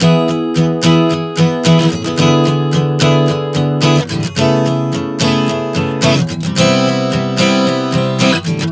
E-E7-C#m7#5-Cmaj7 110 bpm, acoustic guitar chord progressions